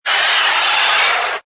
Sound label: ANIMAL CREATURE